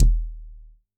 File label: Kick, drum